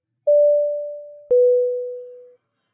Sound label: seat Belt; FlightsAPI